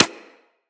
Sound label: Bullet Impact Generic